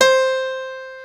PIANO C5; Big Fish Audio; Synth City

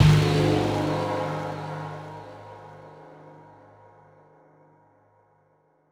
Mega Drums, Korg 01W

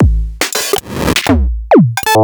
Teenage Engineering PO-12

